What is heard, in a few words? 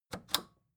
record player power click off slow